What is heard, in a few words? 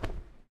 bird flap